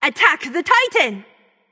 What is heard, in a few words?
vox attack; smite; mulan; voicepack